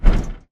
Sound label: bt footstep jog parts; Titanfall 2